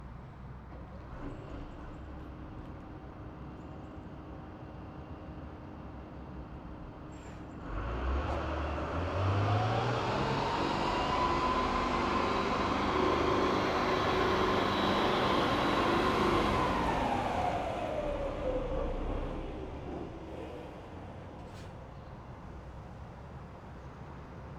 VAB exterior fast distant forward reverse, APC Renault VAB; Pole Position